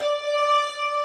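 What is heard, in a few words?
4D L, Slow Fast Hammond, Organs; E-MU Producer Series Vol. 5 – 3-D Audio Collection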